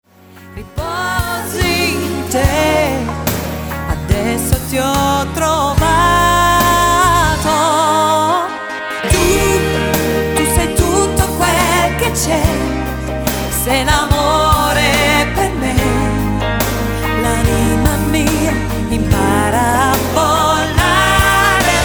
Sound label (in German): lied